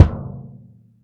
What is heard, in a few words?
BD, Bassdrums, Roland TD-20